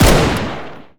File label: fire, m16 weapons